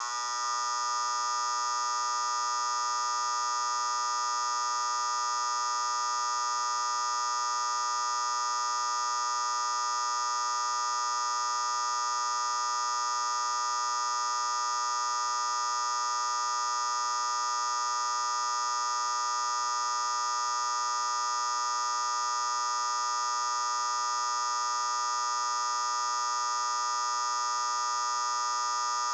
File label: Fluorescent Light Ballast, Flickering; Haunted Bloodlines; SFX Producer; Lights